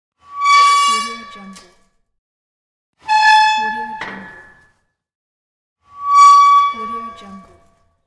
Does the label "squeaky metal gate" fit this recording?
Yes